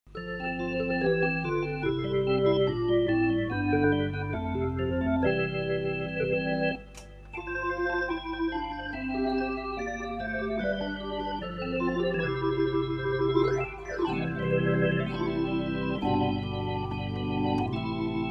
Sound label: Tonewheel, HammondT522; The mighty sound of the mechanical scanner vibrato, set to the notes of a famous anthem.; LetItTonewheel